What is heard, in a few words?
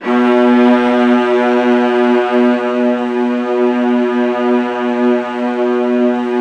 VIOLAS C#3, Strings; ILIO